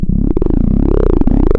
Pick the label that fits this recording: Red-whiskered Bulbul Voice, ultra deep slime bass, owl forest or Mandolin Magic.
ultra deep slime bass